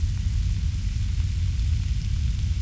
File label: coals; ambient